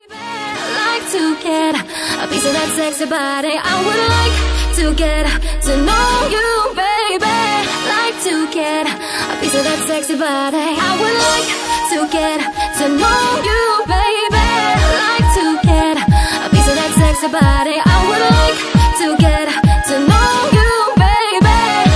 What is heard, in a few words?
Bassline Mix